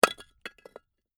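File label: Brick Impact Or Cinder Drop; Stone slab, brick or cynder drop to the ground and settle.; Rock Sound Effects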